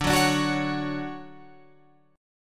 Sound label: D Major 7th, Guitar, Open F; DM7 strummed